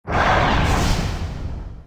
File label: runes regen; csgo; wcs go